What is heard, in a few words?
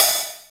HH, Dance Mix